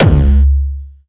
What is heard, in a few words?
Jump kick, Jump hardstyle kick